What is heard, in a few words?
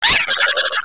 maggot angry